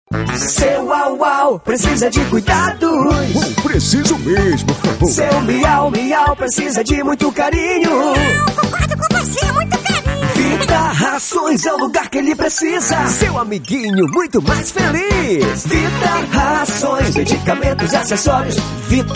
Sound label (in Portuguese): jingle pet, Jingle comercial